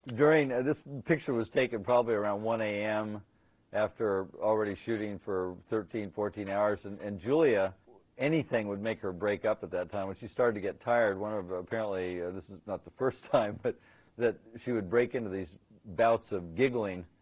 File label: laughs